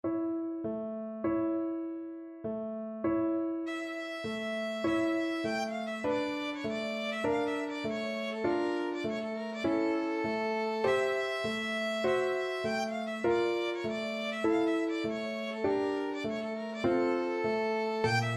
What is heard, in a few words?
romanian folkdance, violin; bartok